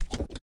Shift To Park; lethal company

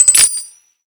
Coin Collect

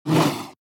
Boss Lite Roar; The Binding of Isaac

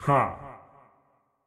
Grunt; Marv

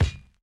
Bounce Sword Large; Going Under

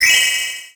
Appear; SE Ing Other Rule#08 (SE Hakt02 Appear)

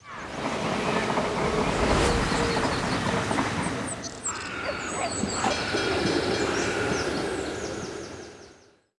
Unlock Cinematic Park

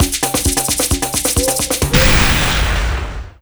Enemy Highcactas Active

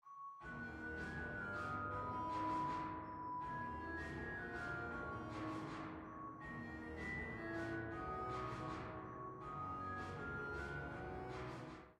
Icecream Truck Christmas Far; lethal company

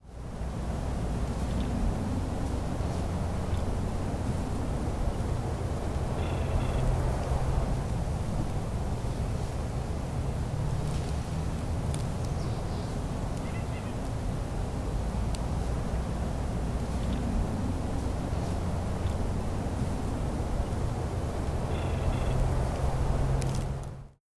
rome Breeze

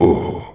Oh; Picnicboat; Neighbours From Hell Console Edition; GC, Xbox

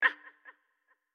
Skeleton Hurt

